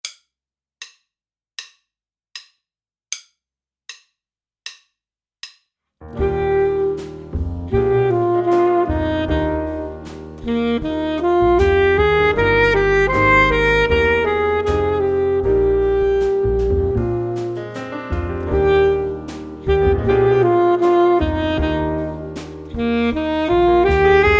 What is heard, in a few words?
Larghetto Full Sax